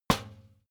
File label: trash basket metal hit bottom